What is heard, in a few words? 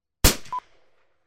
308 Rifle Shot Closeup